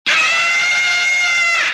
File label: yell